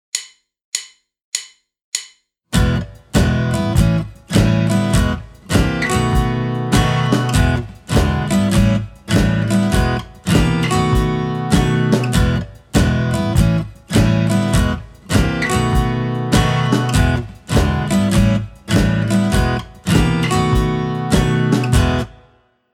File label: liven up chords